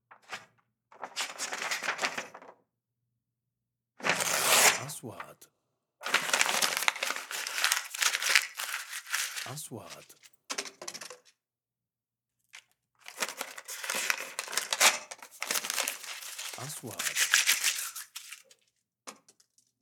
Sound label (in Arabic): ورق، مزع ورق